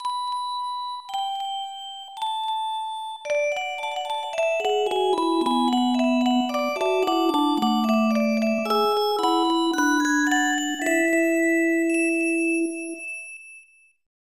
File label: Angel Chime; super momotarou dentetsu dx; snes